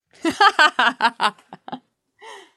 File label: laugh; hl2dm